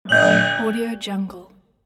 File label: robot error signal design